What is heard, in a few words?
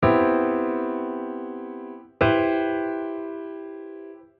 dissonance in upper and lower register